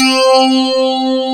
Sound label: pluck C4, FM pluck